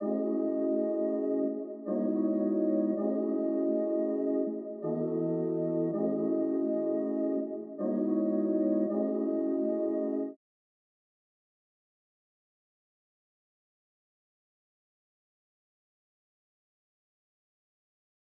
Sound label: 162 BPM C# Min Pad; Melody; RnB; Loop Stems; Cymatics; 2022 Melody Collection - RnB Pt 1; Goddess